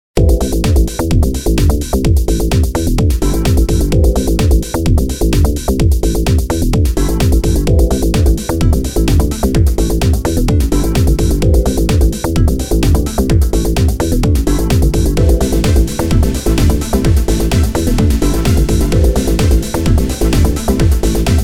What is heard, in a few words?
simple bgm